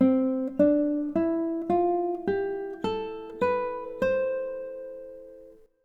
C Major Scale

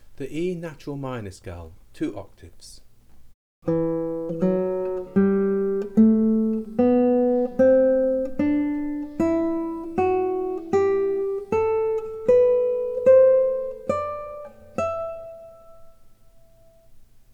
E Natural Minor Scale

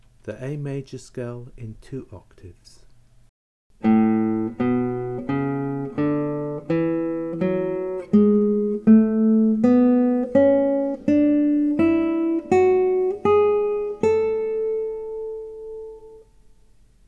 A Major Scale, guitar

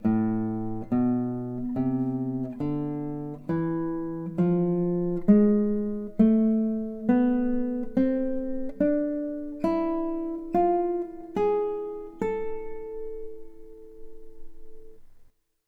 Harmonic Minor Scale

